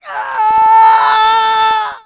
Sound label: Falling Scream; RnC Sounds